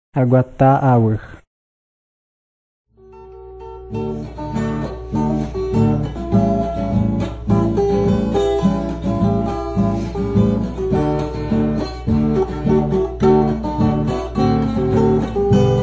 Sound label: songs guarani mbya; bible